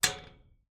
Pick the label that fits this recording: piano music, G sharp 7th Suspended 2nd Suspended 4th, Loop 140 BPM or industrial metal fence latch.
industrial metal fence latch